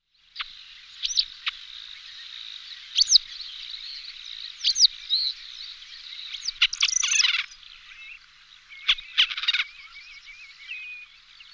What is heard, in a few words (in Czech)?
Motak luzni Circus pygargus, nasiptaci